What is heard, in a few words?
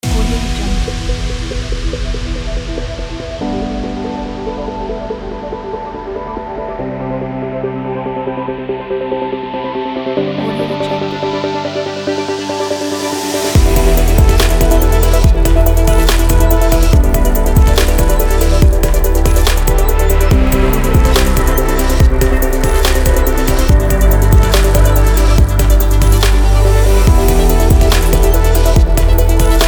idm electronic